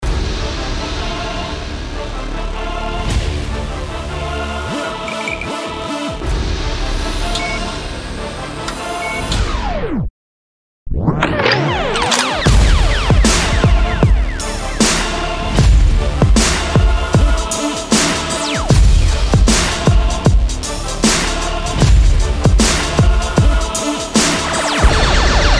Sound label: Deutschrap Drumkit